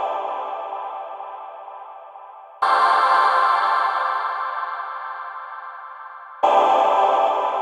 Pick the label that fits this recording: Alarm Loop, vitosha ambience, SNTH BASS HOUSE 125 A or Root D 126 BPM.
Root D 126 BPM